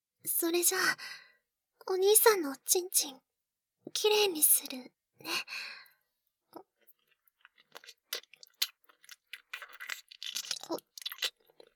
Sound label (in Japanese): お掃除 フェラ